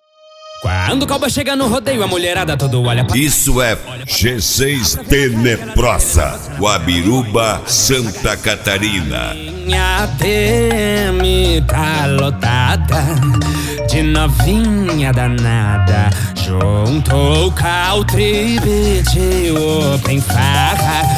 sertanejo automotivo

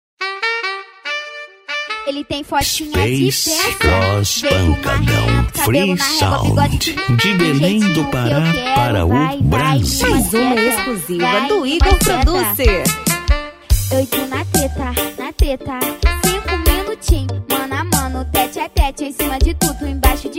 pancadao reggae

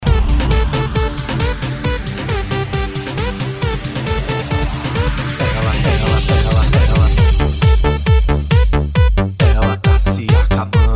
eletro funk retrô

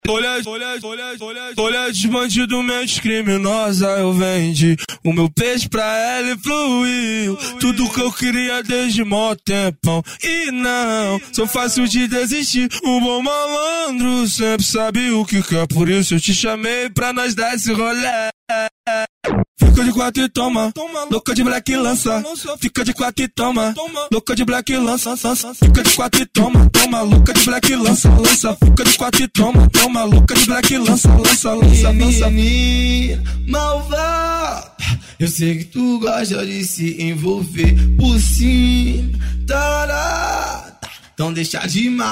funk e rap

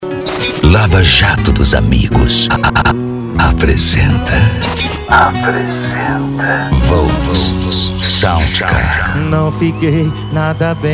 sertanejo e pisadinha; cd volks sound car

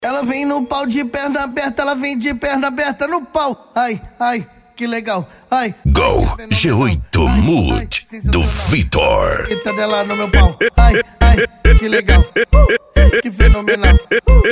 funk ritmado

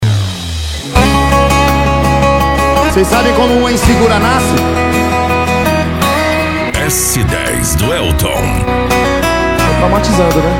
sertanejo

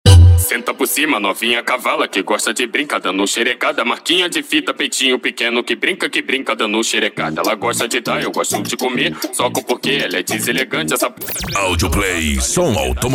esp funk mega bass

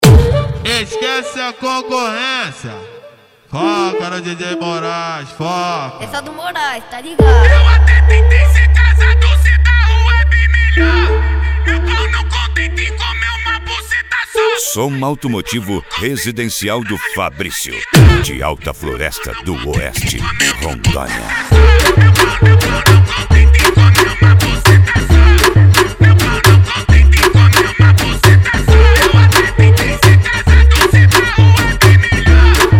som automotivo funk bass

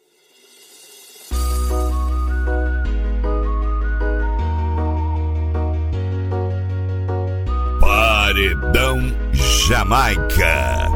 reggae internacional

